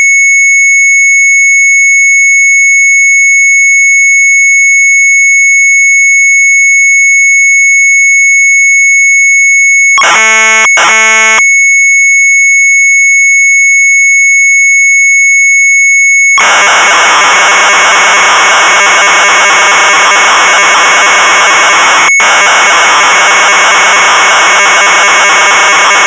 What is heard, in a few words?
Sharp MZ800 Tape